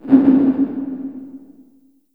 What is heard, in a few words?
LH MC808, REVRB LARGE HALL